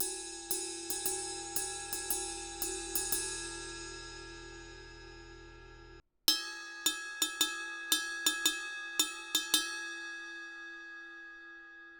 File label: meinl 22 inch heavy ride ride bell